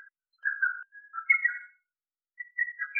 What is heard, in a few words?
Lesser cuckoo